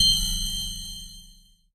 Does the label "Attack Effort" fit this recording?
No